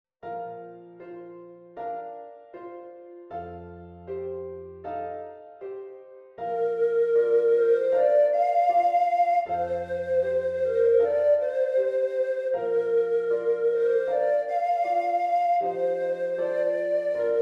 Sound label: SUO GAN for Treble Recoder Piano